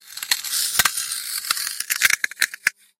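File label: freeze; zamorozka; DndGamePlayer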